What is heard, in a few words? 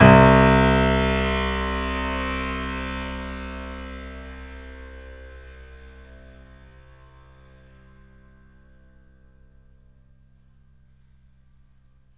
RATTL A#2, The Piano; FX; InVision Interactive